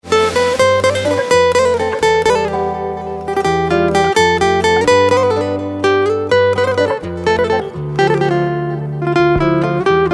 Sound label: Ringtone